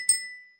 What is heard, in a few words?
notification pop